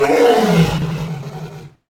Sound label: lion